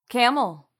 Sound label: camel; bestiary; 5etools